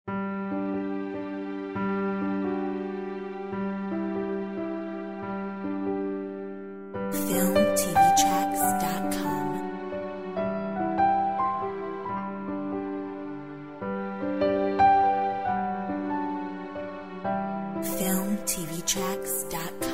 peaceful heart Orch

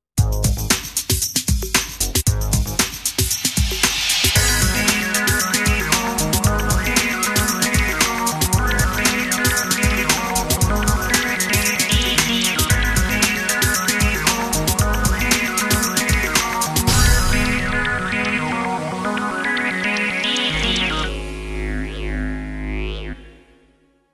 High tech

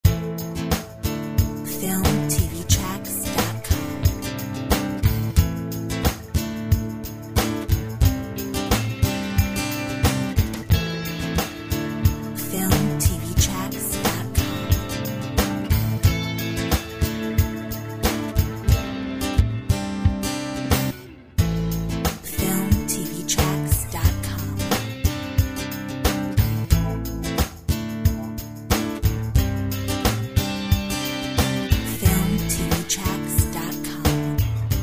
Heartland acoustic; Filmtv-tracks

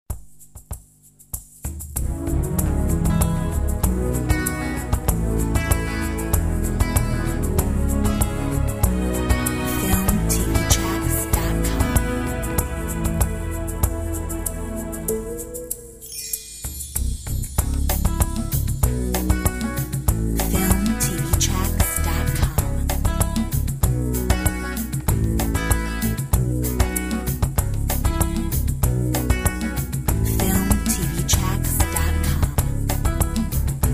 Spring Flowers

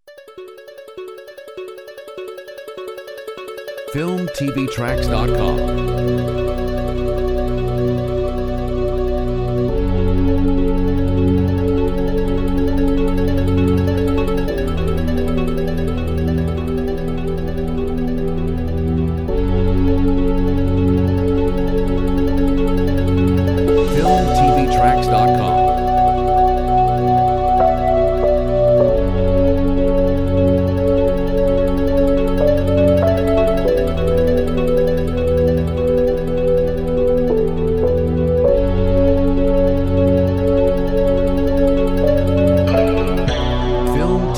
Bio tech

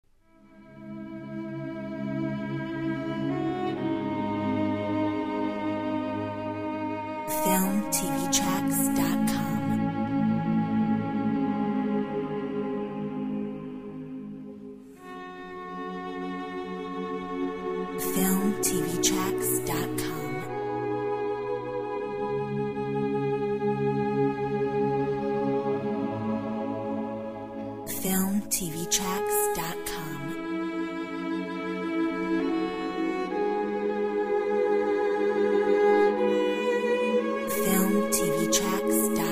choir

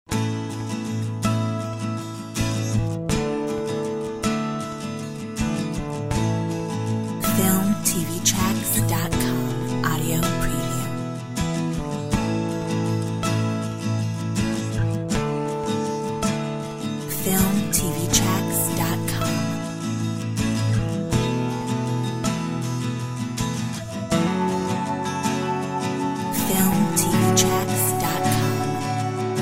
Acoustic Journey

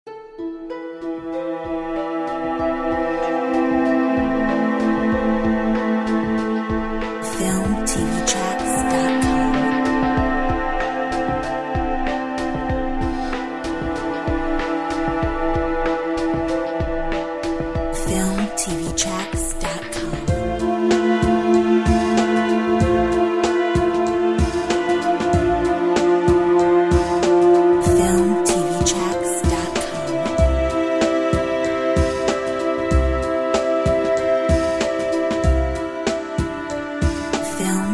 Strings

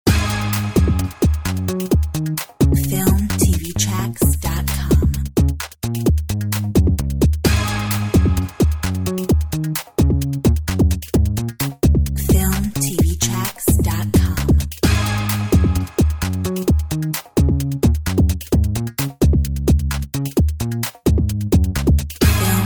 Drum bass; Filmtv-tracks

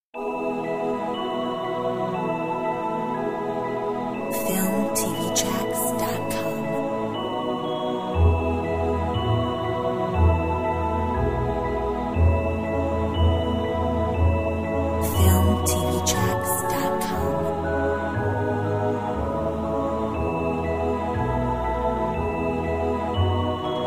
Magical Fantasy

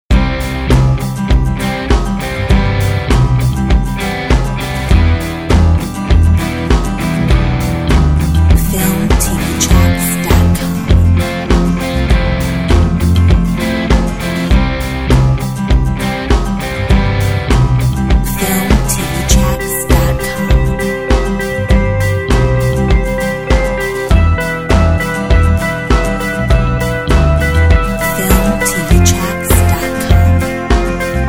Modern Rock

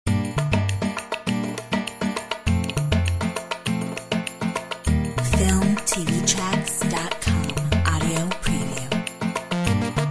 Arabic Dance

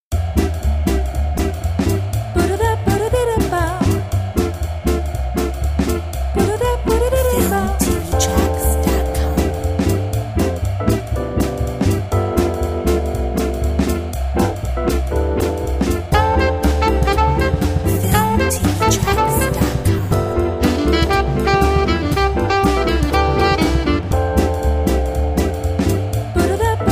Swing

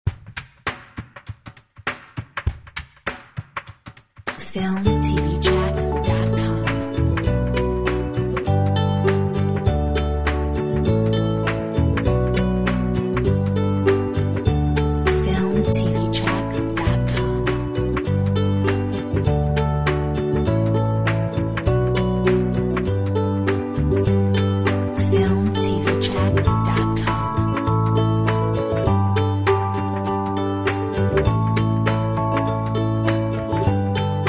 Ukulele

